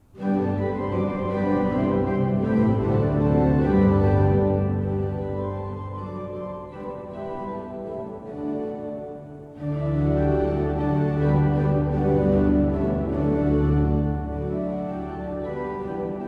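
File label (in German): Seifert Koeln, pfeifenorgeln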